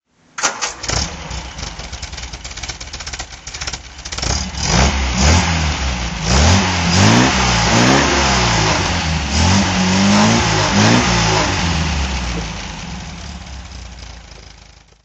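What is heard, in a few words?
mgb road throttle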